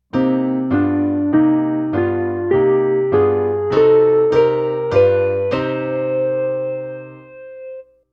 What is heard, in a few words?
Minora Dominant; Garmonizazya